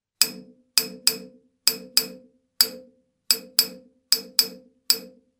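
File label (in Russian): стук по батарее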